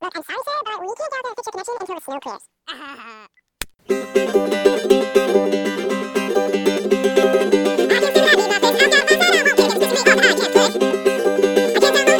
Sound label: RINGTONE